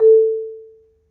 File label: A2, Marimba; Terkelsen